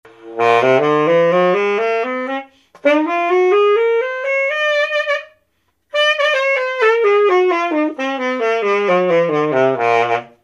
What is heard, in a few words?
Conn C Straight Gold Scale